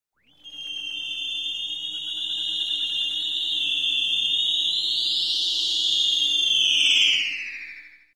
Eerie Synth Tone Rising & Falling In Pitch & Intensity; Electronic Sci-fi